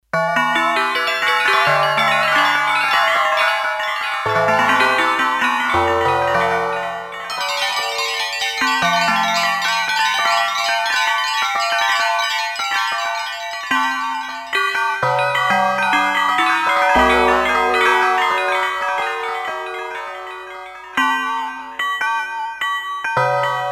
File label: Resound Big "B", Roland D-10; Factory Patches